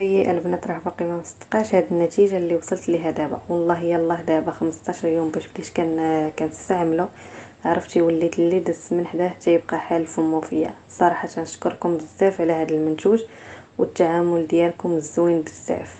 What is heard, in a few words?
VOCAL